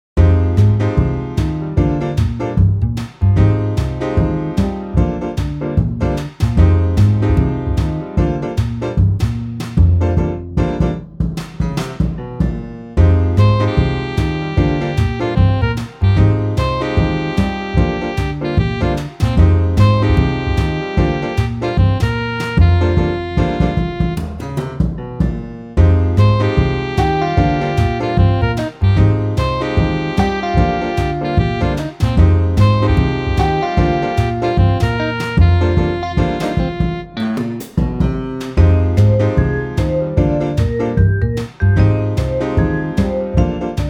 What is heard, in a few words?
JAZZ LOUNGE